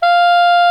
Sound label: SOP SAX F5; Greytsounds VOL-1